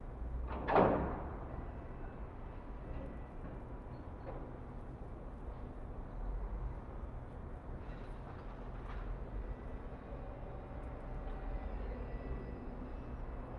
port ambience distant container terminal trucks cranes alarms metallic booms 1000 ft; fvtt sonniss gdc audio